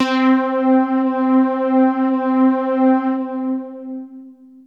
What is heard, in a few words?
Techno leads, hits and riffs